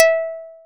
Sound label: plucked, programmable speaker